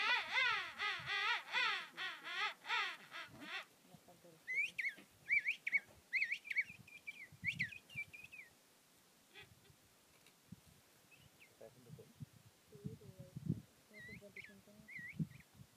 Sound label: Waterfalls: Iguazu Falls, Argentina